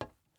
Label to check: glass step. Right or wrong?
Right